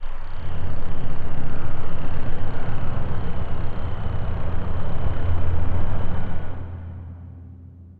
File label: Drone; Laboratory; Cylinder